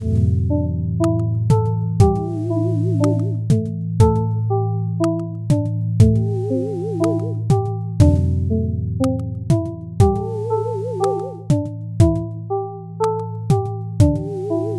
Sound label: battle loop; bgm; heros